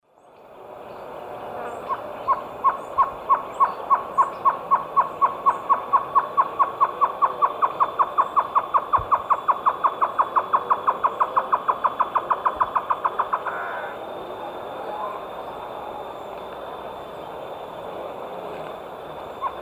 Taraba major, song; S. America; Eastern Lowlands

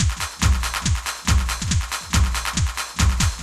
techno 140

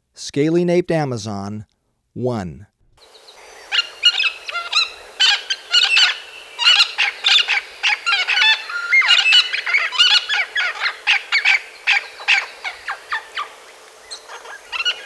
Scaly-Naped Amazon, Birds of Ecuador; S. America

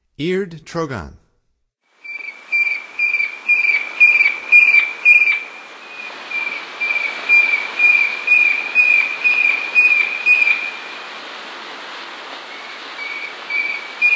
Eared Trogon, Songs of Birds of North America; Stokes

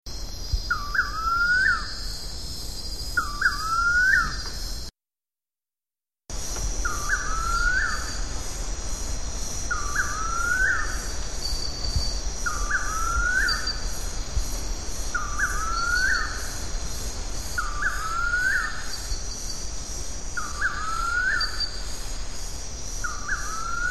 Silky-tailed Nightjar; S. America

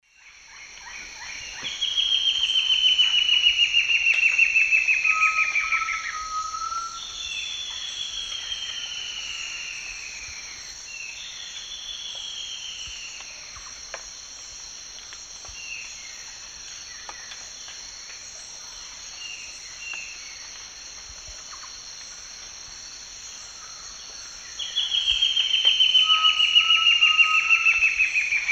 Myrmoborus lugubris, song; S. America; Eastern Lowlands

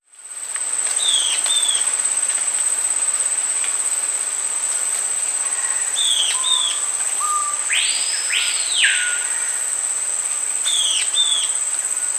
Euler's Flycatcher (Lathrotriccus; S. America

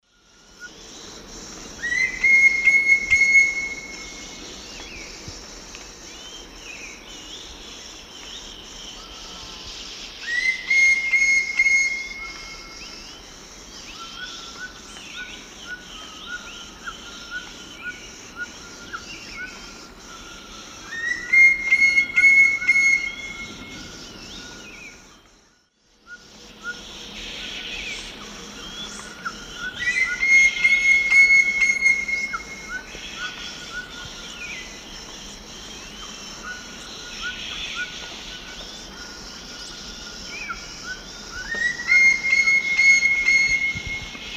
Celeus torquatus, call; S. America; Eastern Lowlands

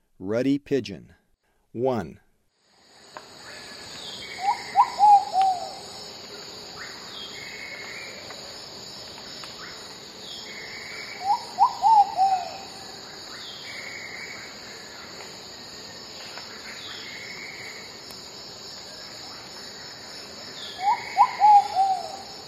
Ruddy Pigeon, Birds of Ecuador; S. America

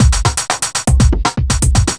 chemical 120, loops 120bpm

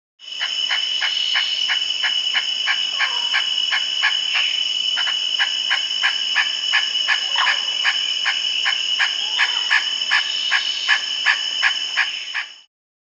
Lettered Aracari (Pteroglossus inscriptus), Peru; Birds of the Amazon; S. America

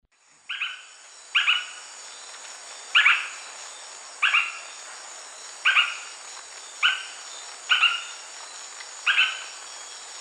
Myrmeciza hyperythra, call; S. America; Eastern Lowlands

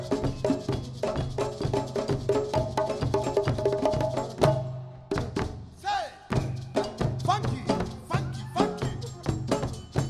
drum Song; Arabic; World Music

